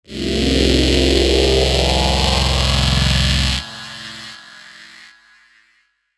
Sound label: Ascend Musical Flange Delay; Blastwave